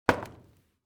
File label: object wood drop indoors; smartsoundfx